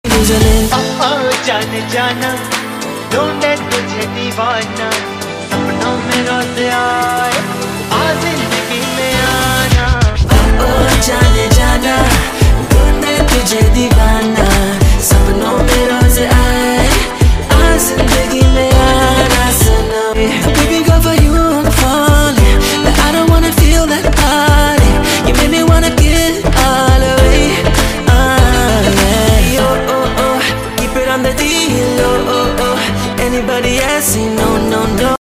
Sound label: hindi song; bollywood